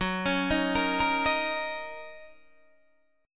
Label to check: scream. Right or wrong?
Wrong